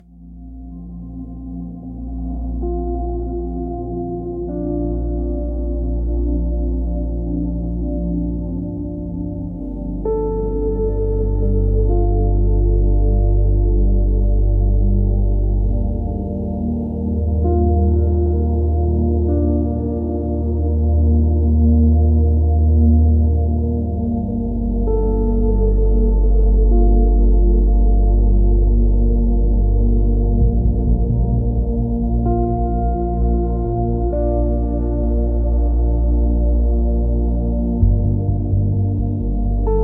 pure ambient; for sleep complete silence

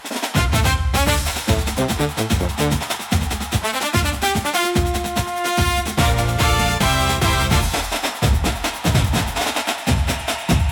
Carnival Parade, upbeat carnival; for festivals and parades

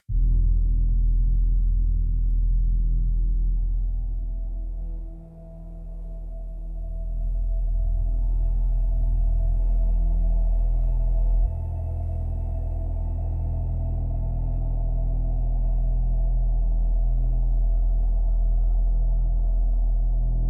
spiritual spooky soundscapes

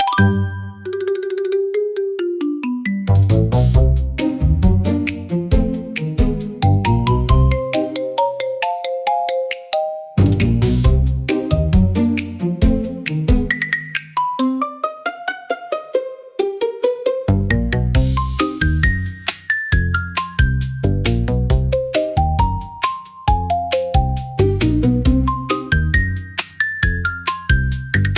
Marimba Sneak, comical marimba for funny scenes

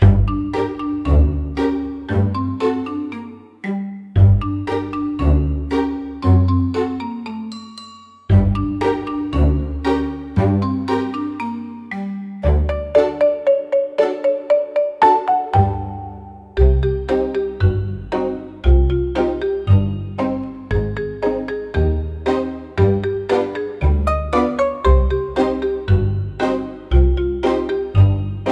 Marimba Mishap, comical marimba for funny scenes

